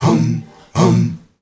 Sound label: MALE HUM; Spectrasonics; Heart of Africa